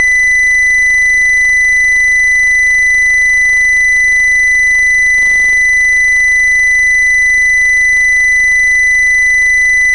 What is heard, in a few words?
modulation through a wireless headphone with a record jack; Table top modulation